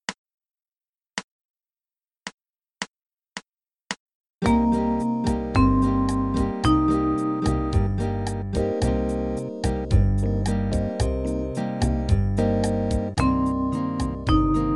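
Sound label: Min7 Third Inversion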